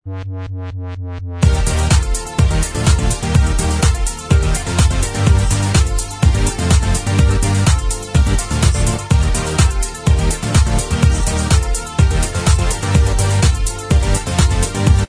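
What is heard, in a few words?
instrumental track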